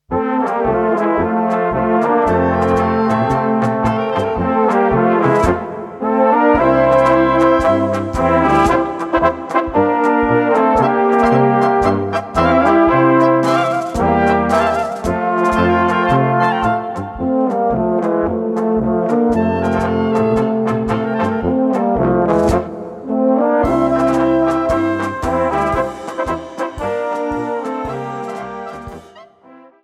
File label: Polka